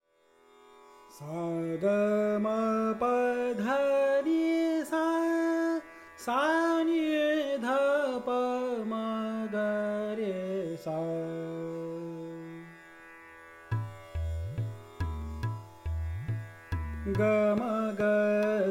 Raga Khamaj Vocal; Bansuri Student Zone

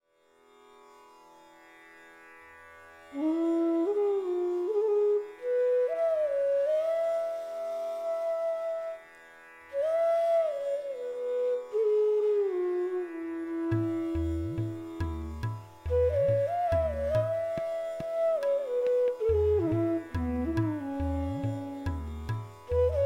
Raga Jait Flute; Bansuri Student Zone

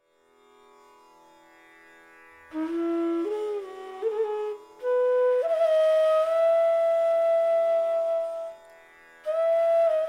Raga Hansadhwani Flute; Bansuri Student Zone

